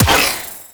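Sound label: Sentient Sniper Projectile Explode; Warframe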